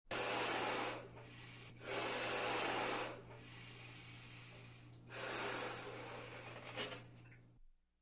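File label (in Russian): воздушный шарик надувают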